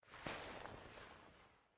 Hug Stop; game audio; Mita; MiSide

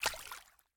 Step Water; Game rip; MiSide

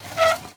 Chair Shpae Move; Game rip; MiSide

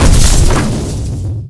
Car Explode; MiSide

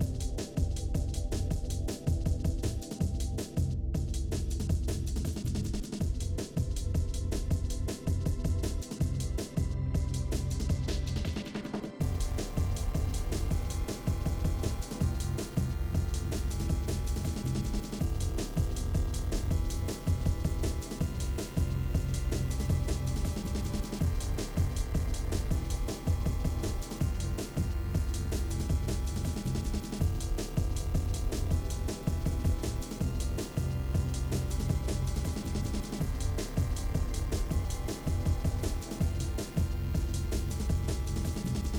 Shooter Music; Game rip; MiSide

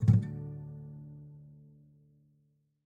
Guitar Take; Game rip; MiSide

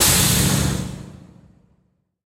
Lift Start Move; Game rip; MiSide

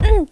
Damage; MiSide

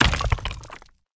Crack; Game rip; MiSide

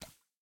Step Blood; MiSide

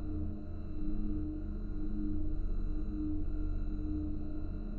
Ambient Horror; Game rip; MiSide

